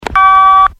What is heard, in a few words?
telephone